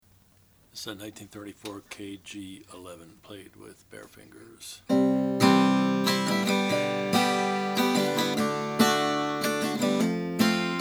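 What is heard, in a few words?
kalamazoo kg11 sunburst, Guitars; 1934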